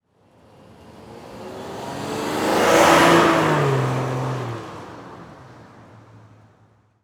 Transportation Truck Envoy Drive By Fast

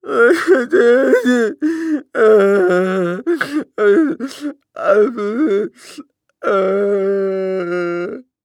Human Male Crying Whiney Sob Mumble

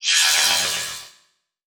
Multimedia Internet CD-Rom Flash Wipe Electronic

